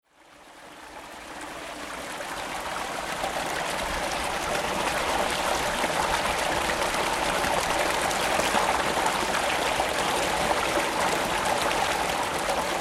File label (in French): Eaux Etangs